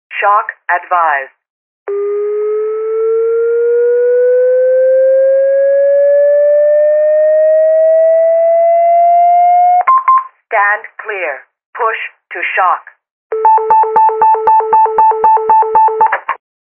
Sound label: defib, aed audio clips